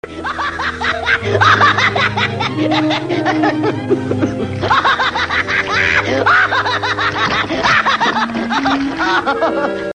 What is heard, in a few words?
Hahahah; Lonneytoons